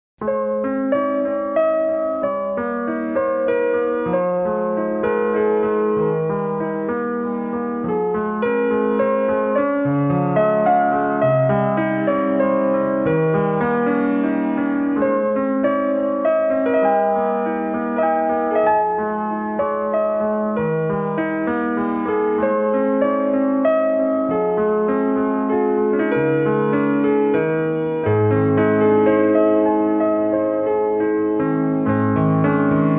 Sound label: Amoret Ab (Music Box), Piano